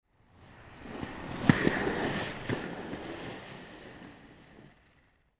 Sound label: skateboard